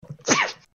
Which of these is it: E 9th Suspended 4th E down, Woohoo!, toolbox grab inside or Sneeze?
Sneeze